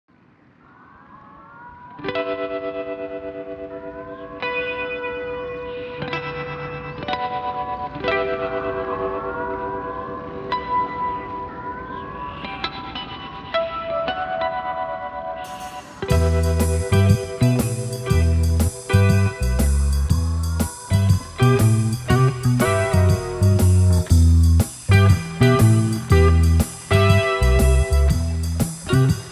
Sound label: Low Tide Shuffle